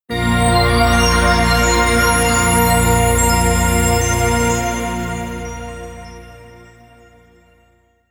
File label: Crystal Final Hit; Zombie Temple